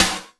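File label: snare drum, drum & bass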